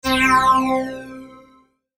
Logo Flange Sweep; Sonic Logo; Blastwave

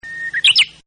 BIRD; Partners In Rhyme

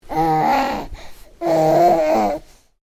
Baby: making vocal noises, slight growl; Babies; Voice; Stuart Duffield

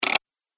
Pc Mouse Wheel; High Tech Sound Effects

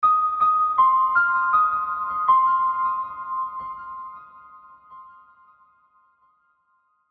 Horror Musical Piano Haunting Nursery Rhyme; Scary Soundscapes; Blastwave

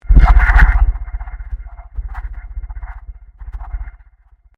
Convolution Surge

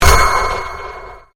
Convolution Pulse

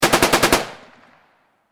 warfare gunshots machine gun burst; Machine Gun Burst 2; McKinney Sound

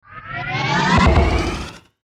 Whoosh Sputter Out Chorus; Blastwave

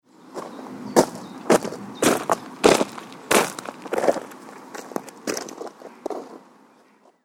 Gravel Path Walking Past, footstep sound effect

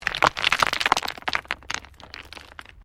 STONES MOVE

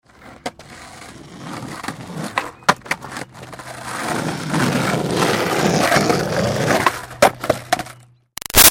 Skateboard Olly Rolling Moves; Pass Bys; Sports; Skateboarding; Big Room Sound

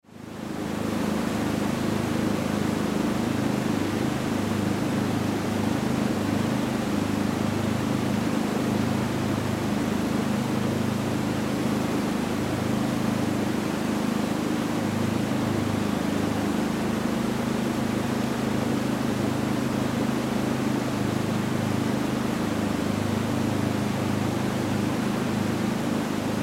Yacht Drive Slow Decelerate; Transportation Watercraft

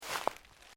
Footsteps Sneaker Leaves Deep Step; Footstep Foley; Human

